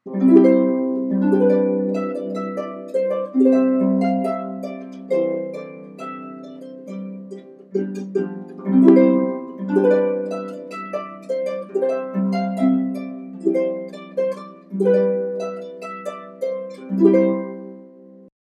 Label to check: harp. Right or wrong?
Right